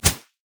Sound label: basic attacks; Zyra